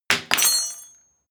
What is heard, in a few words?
rubble sparse fall indoors